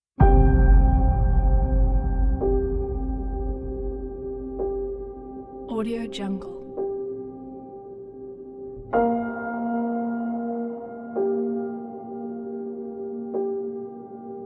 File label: emotional sad dramatic trailer